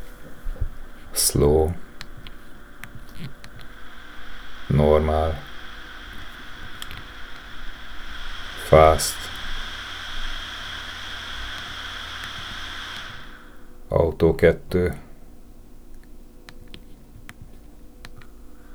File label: fan modes